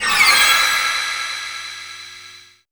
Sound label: FLY-BY; Spectrasonic Distorted Reality 1